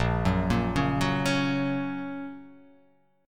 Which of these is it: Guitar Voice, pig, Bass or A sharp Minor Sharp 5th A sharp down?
A sharp Minor Sharp 5th A sharp down